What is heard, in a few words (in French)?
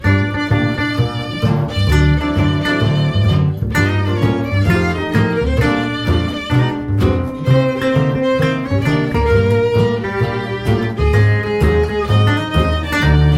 douce ambiance